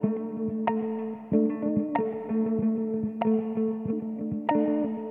Electric Guitar Loop For Background Music Fast Action